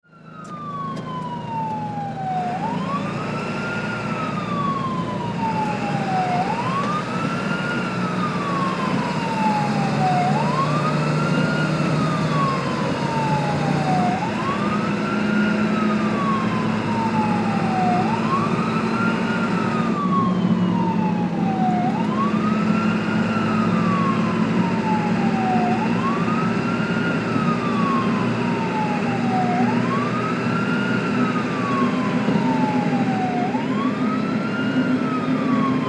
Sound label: Ambulance Drive, Ambulance Drive Interior Sirens Medic Chatter; Emergency; Emergency Vehicles